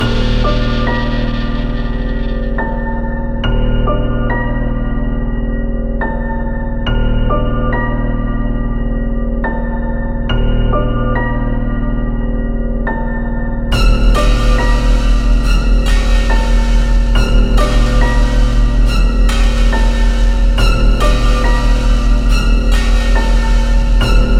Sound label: bgm